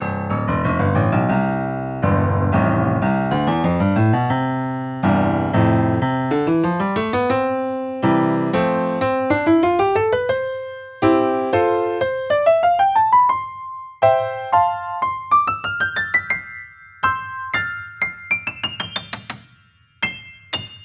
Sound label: Lauf EZKeys Upright